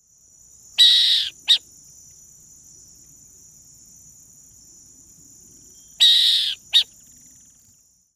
coruja buraqueira

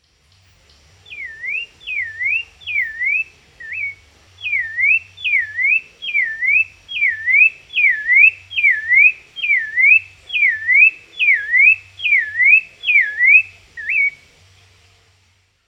sabia laranjeira

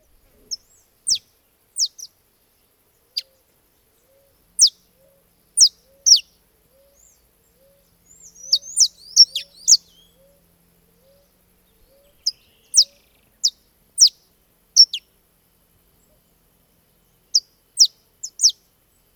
canario da terra

